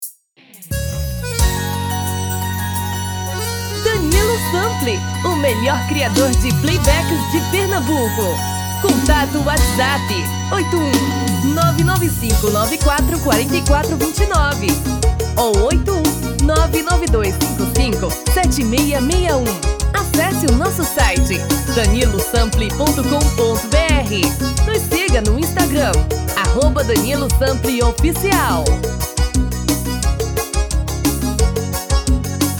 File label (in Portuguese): dois tom abaixo